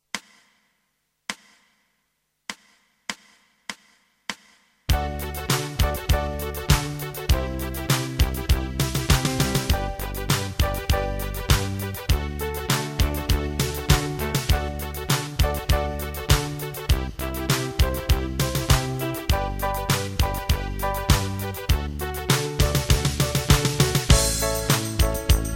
Techno Power, Guitarbacking

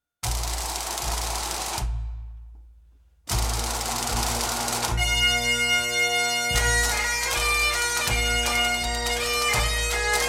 march

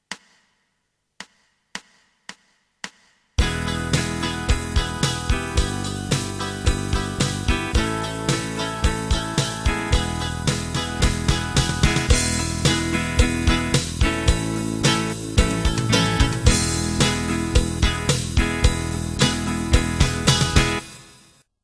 E minims, Guitar backing

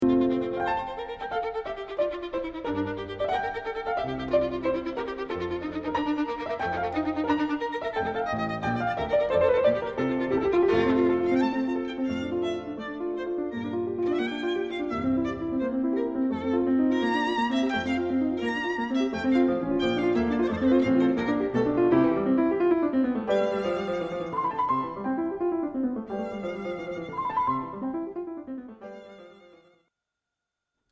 sonata

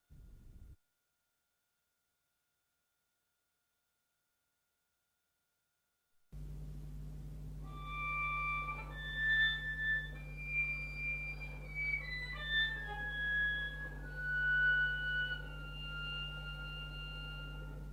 harmonics

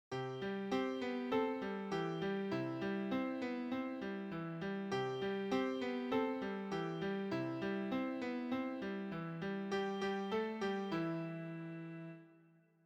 diminution

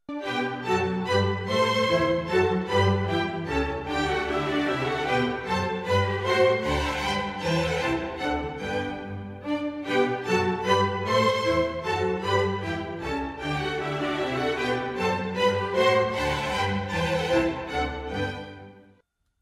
hemiola

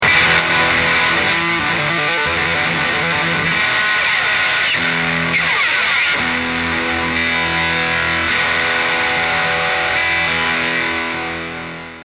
distortion

